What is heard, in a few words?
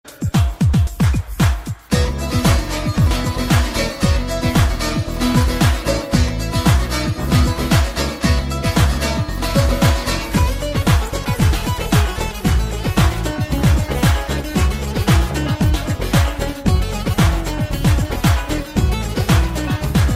Baglama